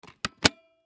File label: Toy Computer Laptop Close; Learning System; Miscellaneous Toy; foley; SoundEffects-Blastwave